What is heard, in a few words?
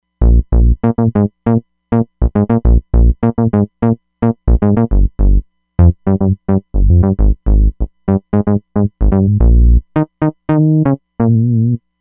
Syn Bass; Roland Fantom XR